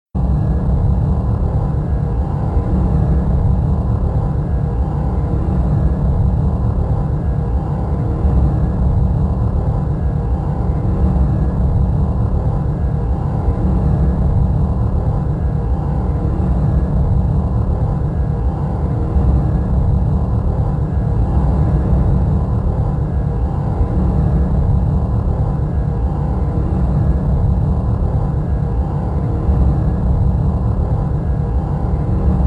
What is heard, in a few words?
Imperial Star Destroyer Ambient Engine Sound